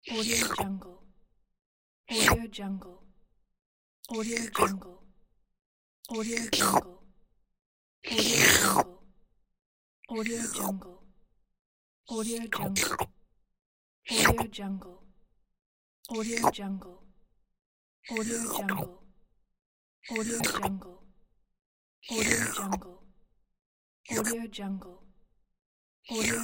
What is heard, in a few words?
licking